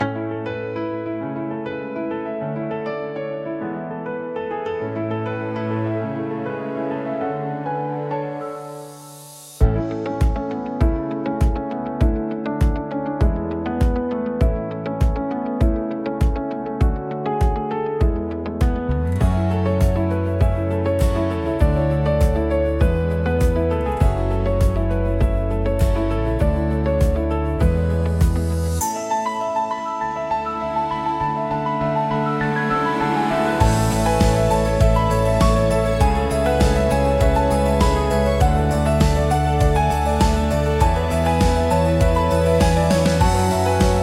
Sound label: Corporate motivational music; upward trend